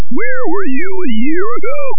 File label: sinewave